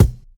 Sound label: good bass drum b key, Royality free steel kick drum sample tuned to the B note